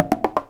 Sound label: konga, drum loops